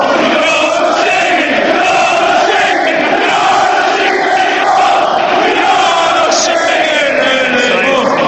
your not singing anymore

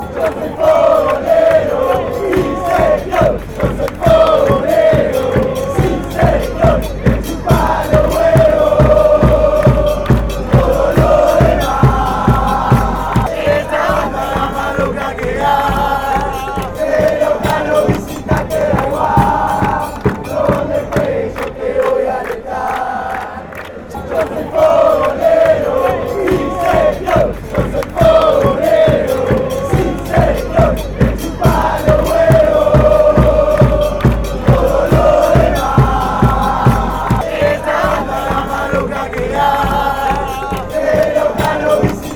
douglas haig chant

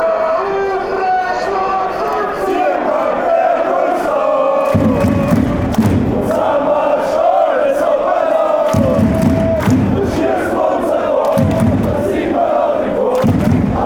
allez allez allez, fan chants; sturm graz

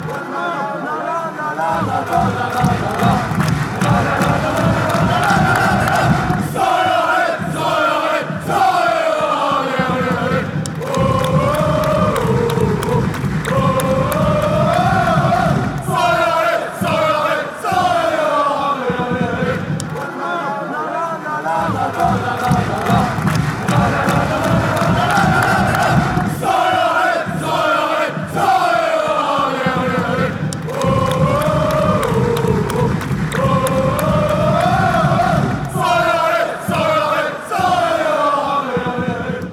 ole, fanchants

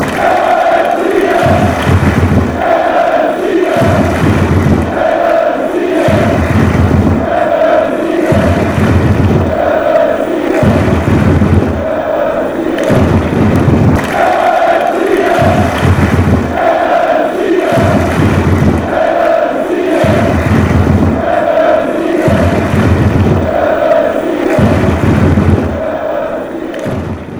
fight and win, fan chants

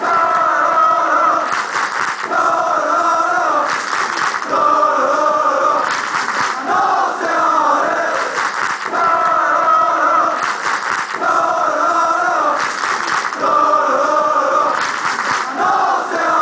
allez, chants; nanceiens

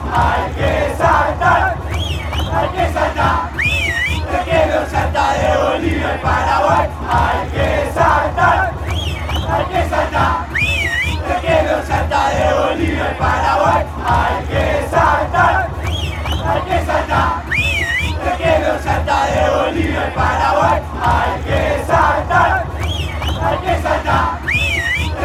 hay que saltar fanchants

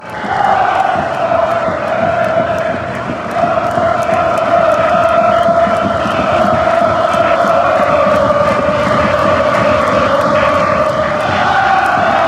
allez standard de liege, chants

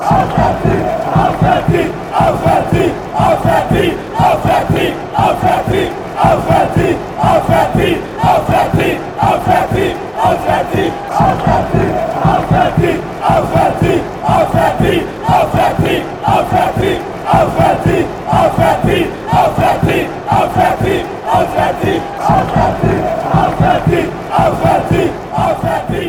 away win, chants

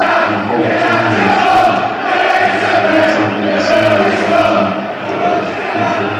interbred you scum fan chants; sydney

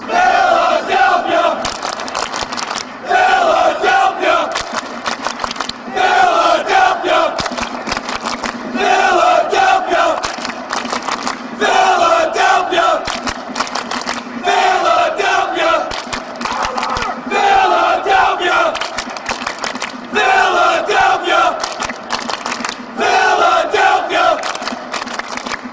philadelphia fanchants

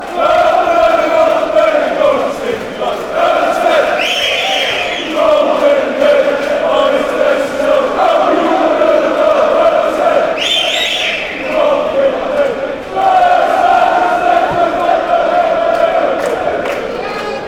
its fat fan chants

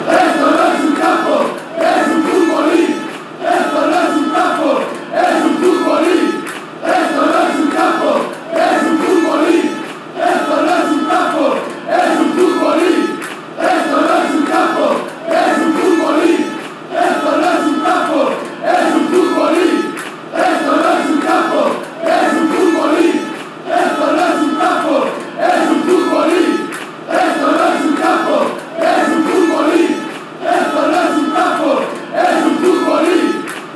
chants; tablefooty

